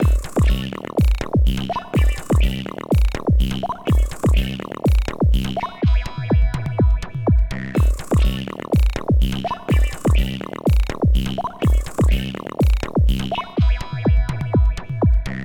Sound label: Plucked Terror Loop